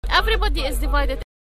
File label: loop